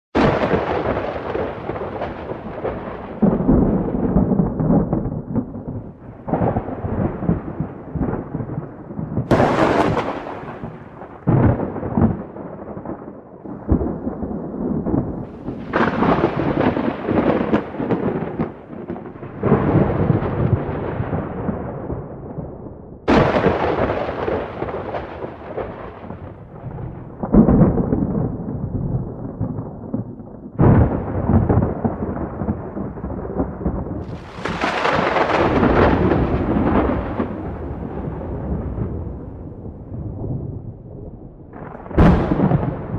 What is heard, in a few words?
sound of thunder